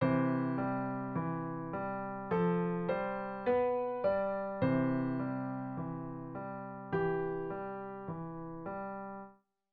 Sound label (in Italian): Sonata con Violoncello